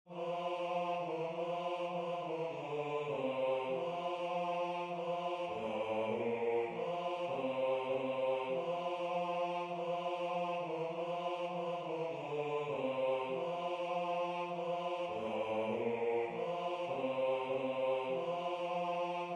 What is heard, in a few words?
Bass